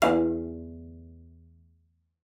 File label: CELLO FINGER E2; 90 s Sample CDs; Xsample VOL-5